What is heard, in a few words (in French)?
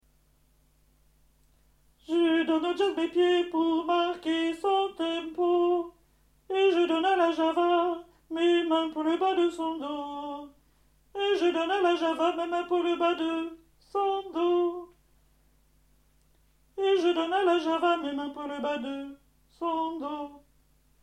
coda alti